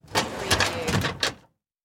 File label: File Cabinet Open; sound-effects-bible